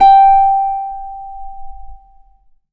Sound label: G4 HARP; USB Soundscan vol.62; AKAI